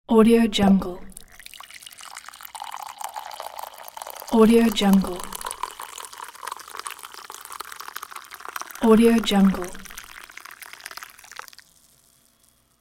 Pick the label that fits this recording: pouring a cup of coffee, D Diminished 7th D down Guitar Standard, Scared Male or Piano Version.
pouring a cup of coffee